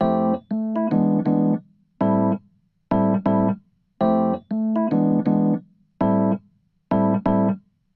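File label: epiano, Hybrid neural guitar overdrive; honeybee; Morphdrive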